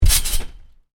Trampoline, bounce on: medium bounce on canvas trampoline; Miscellaneous Sport; Stuart Duffield